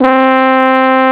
trumpet, synth; OctaMED SoundStudio V1

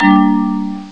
bing; My Science Fair

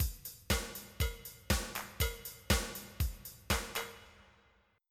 disco pop

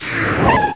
ZIP

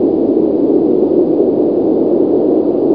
wind, ambience; Hexen 2

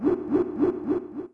air; phoenix-end

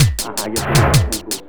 DRUMLOOP D+B 160, Drum+Bass Loop; eJay

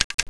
klick; f1; PC Gamer 34

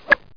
chip; PC World Komputer 1997 February; micrsoft; golf

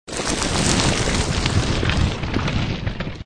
Grow Tremble; mystic training

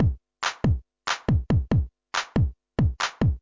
drums; PC Gamer IT CD 48; pong; Pong Demo; music; ice

